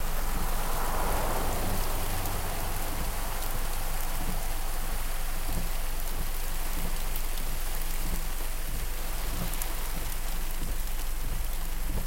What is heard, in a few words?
Driving In Rain